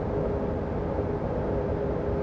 industry; Mafie 1

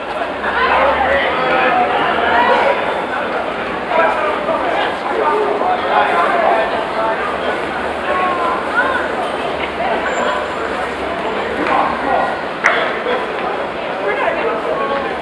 party; Mafie 1

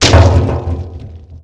bush; Mafie 1

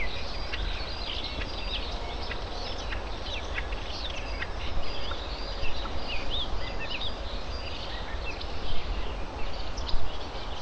out forest; Mafie 1

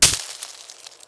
bush; Mafie 1

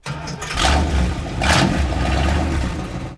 bug on; Mafie 1

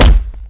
body; Mafie 1

